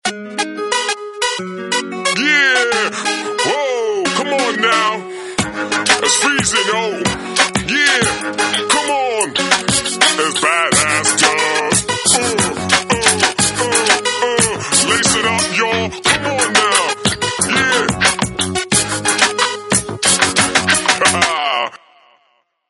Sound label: Hip hop Guy